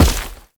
Heavy Step Dirt, Footsteps; Warframe; Tau Dax

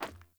Sandals Run Metal, Footsteps

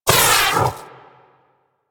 Armillary Sash Impact; Warframe; Warframes; Nezha

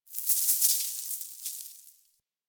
Mountain Pass Snow Hit Player; Ambience

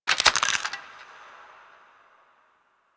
Bone Break; Ambience; Golden Maw; Warframe; The War Within

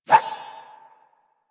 Rapier Whoosh; Swords

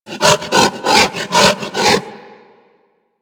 Feral Vocal Breath Run; Enemies; Kubrow; Warframe

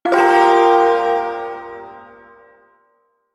Ersatz Shawzin Min Chord; Warframe; Duviri Ersatz Shawzin

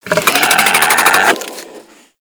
Infested Charger Idle, Enemies; Warframe

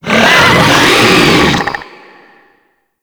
Infested Ancient Attack, Enemies; Warframe

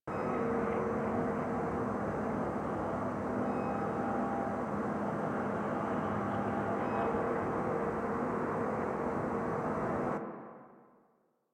Sacrifice Memories Room Ambient Loop

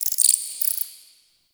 Under City Tiny Creature; Ambience; Dungeons; One Offs

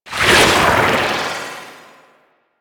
Undertow Cast; Warframe; Warframes; Pirate

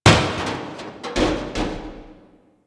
Destruction Metal Falling; Ambience; Warframe; Corpus Railjack

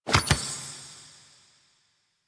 Tarot Card Pickup; UI; Pickups; Warframe

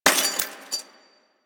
Glass Bullet Impact

